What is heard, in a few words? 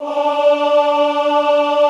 OHH D3, Classical Choir; Best Service; Extended Classical Choir